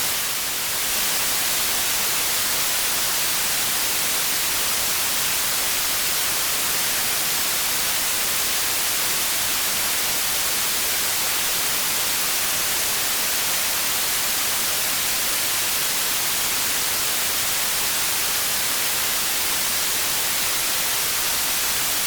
ambience rain

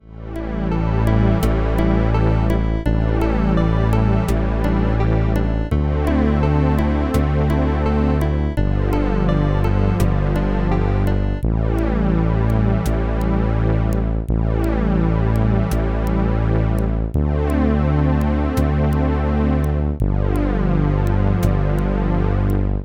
music video synth

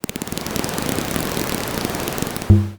roller door

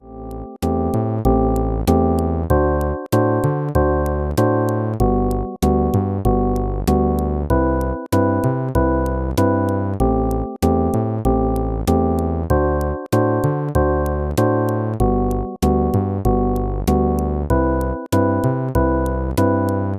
music record shop

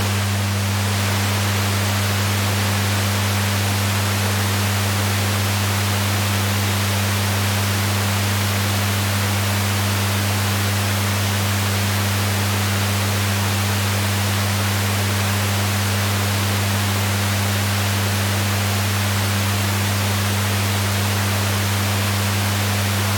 roomtone retail

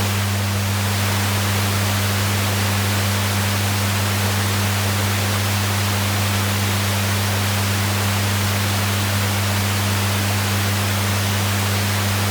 roomtone retail

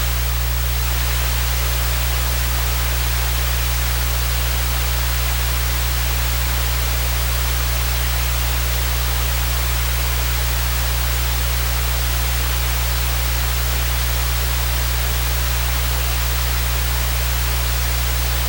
roomtone video

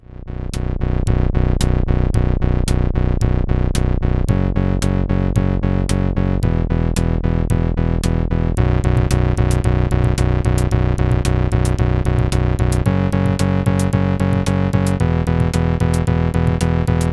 music gig grunge

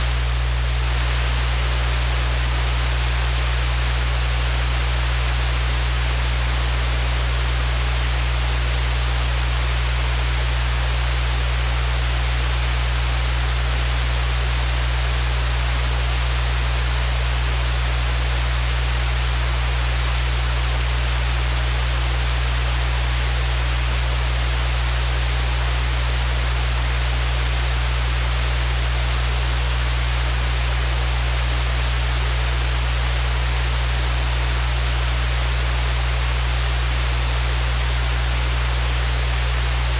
roomtone video

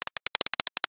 riffle

